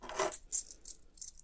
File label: lifting keys